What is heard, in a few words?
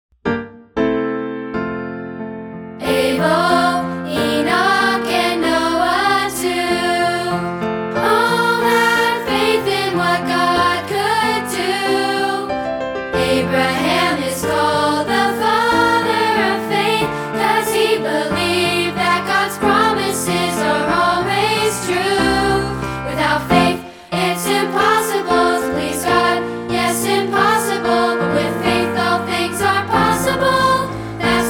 full piano